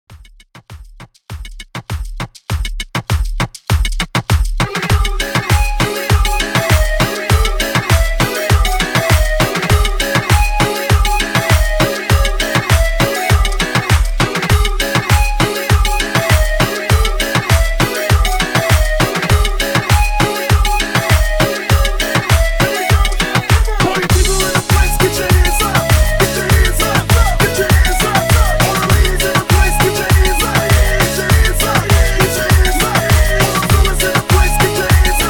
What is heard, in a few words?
100 BPM CLEAN